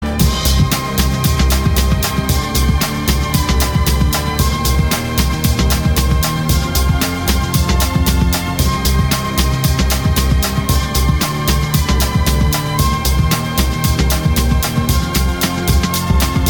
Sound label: Instrumental; OST Matrix Reloaded